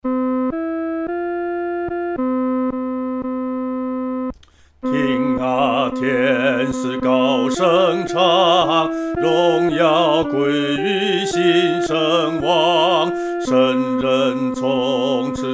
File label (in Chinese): 独唱（第二声）; 听啊，天使高声唱